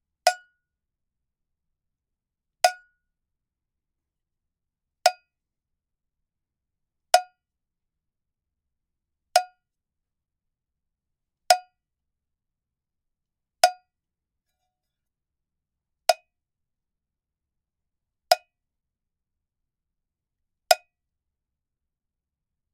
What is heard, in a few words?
MODERN LP BELL COWBELL STRAIGHT MUTED STICK HITS; The Chris Alan; Musical Oddities; Bells, Whistles, Horns, Percussion and More; GDC 2018; Game Audio Bundle